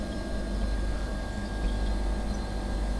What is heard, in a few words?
reloop